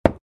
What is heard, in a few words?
Single footstep on a hardwood floor high heels